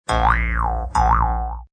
Mouth Harp, Mouth harp, boing, cartoon, FX from human voice; Boings; Cartoons